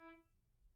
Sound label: Grand Piano Main Release E3, Kawai; Chordophones; Releases; Legacy